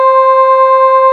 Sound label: TX ANGEL C4